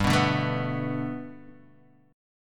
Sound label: G Major Add 9th Guitar Half Step Down, chord